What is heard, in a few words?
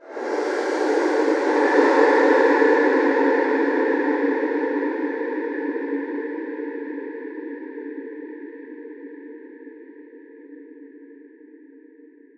Atonal Depths, Psytrance